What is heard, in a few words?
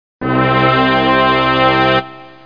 Slow attack Brass; Electronic Whole Earth; MUSIC